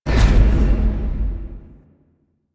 Corrupted Aura Outer Touch; Warframe; Zariman; Survival

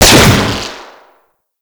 Raptor Fire 3D, Weapon Fire; Warframe

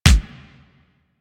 Weapon Punch Shotgun; Warframe; Weapons; Shotguns

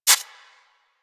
Rodent Step Dirt; Characters; Foley; Warframe

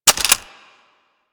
M16 Drop Clip, rifle; Grineer

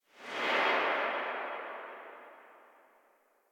Rifle Tail C; Weapons; Warframe; Tenno; Bard Rifle

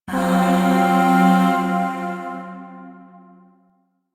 Zariman Void Shawzin Hirajoshi Chord

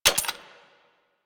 Rifle Alt Mech C; Sundial

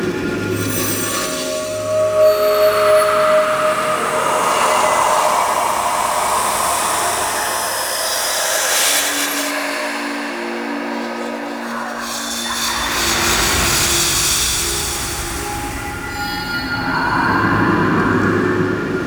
Helmet Carry Amb Loop; Friendly; Tuvalkane; Discovery

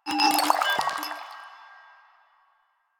Vial Foley B; Warframe; Lasrian; Lasrian Soldiers; Footsteps; Lasrian Goonadier

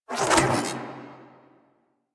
Arch Gun Mode Switch Beam; Warframe; Archwing; Hildryn

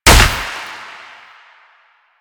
Proc Explosion; Mods

